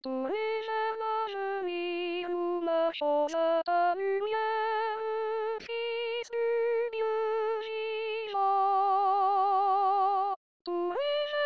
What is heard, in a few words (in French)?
soprano, chorale